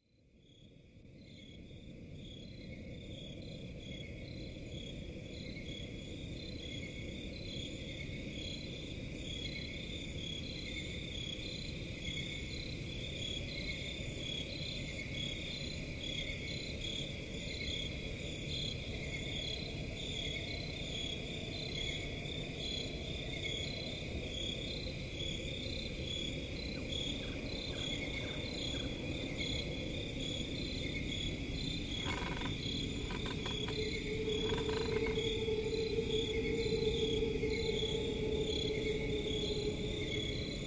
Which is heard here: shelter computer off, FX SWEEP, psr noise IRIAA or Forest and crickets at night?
Forest and crickets at night